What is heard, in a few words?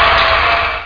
train brake; svencoop; hunger; hungerplats